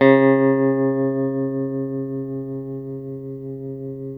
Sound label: TELE TREM D 3A, Guitars; Spectrasonics; Hans Zimmer Guitars VOL-1; TREMO-LUXURY